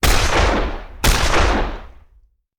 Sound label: Shotgun; Sound Ideas; Guns